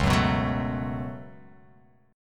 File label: C sharp 7th Suspended 4th Sharp 5th C sharp